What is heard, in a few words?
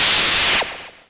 lazer